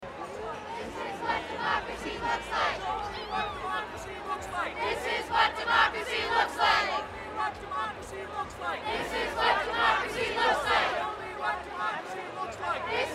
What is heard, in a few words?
Hands Off March; This is What Democracy Looks Like